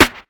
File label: clear snare drum sound f key, steel snare drum sound tuned to the F note; snare-drum-samples